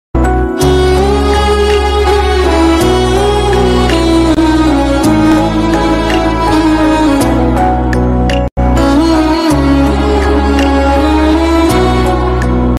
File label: telugu ringtones